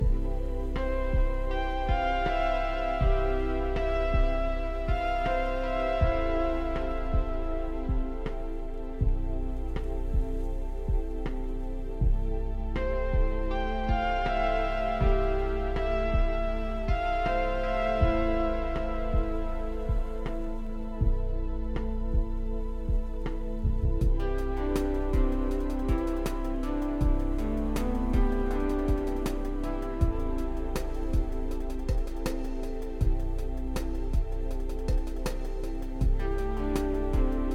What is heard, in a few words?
Menu Cloth; miside demo windows gamerip 2023